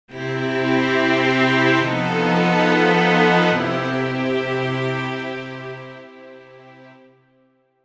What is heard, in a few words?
half steps, altered chords; altered iv